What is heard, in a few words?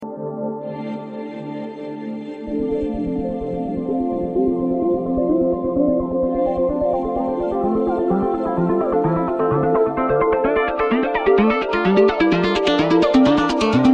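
background music beats tone ringtone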